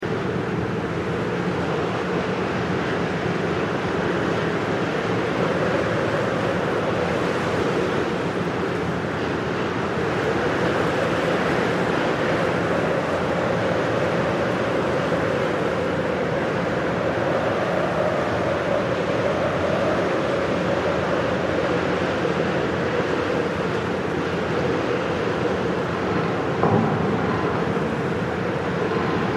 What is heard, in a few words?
above bridge ambience; inFamous; PS3